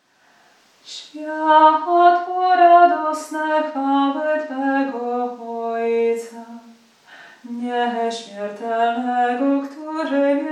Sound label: hymn; Hymn 11 11 5 Swiatlo radosne